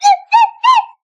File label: slide whistle good laugh